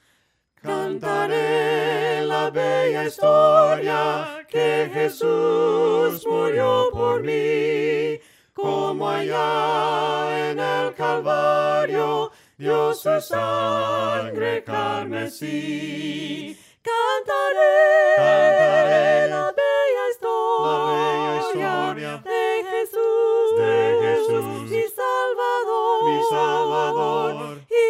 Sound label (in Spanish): voces; CANTARE LA BELLA HISTORIA; cantos del camino